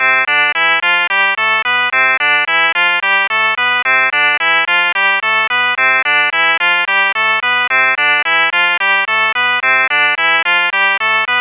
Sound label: harmonic scale tone loop, shepard tone